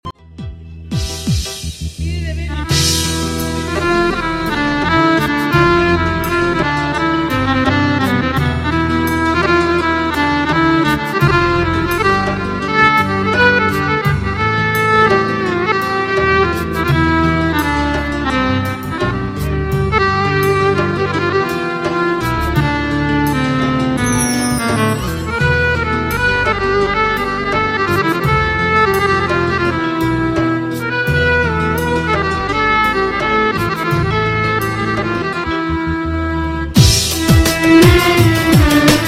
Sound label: pa1x kamanja